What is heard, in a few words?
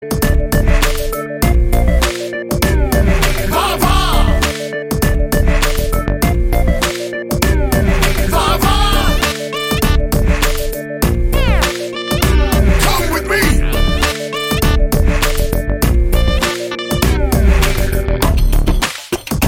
Karaoke BGM